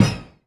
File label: Korg 01W MegaDrums; 300 Drum Machines